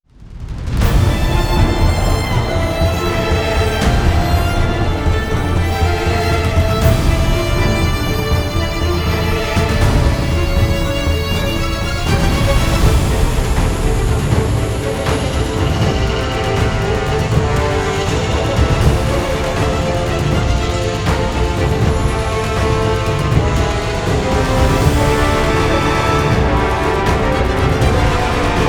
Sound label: Battle Phase Two; where winds meet original soundtrack 2025; Shattered Qianye